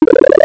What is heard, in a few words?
pickup